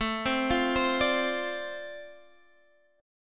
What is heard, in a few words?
D-Minor 7th A, chord